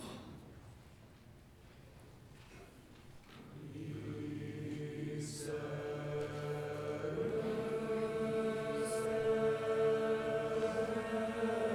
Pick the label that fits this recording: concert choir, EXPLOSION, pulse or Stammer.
concert choir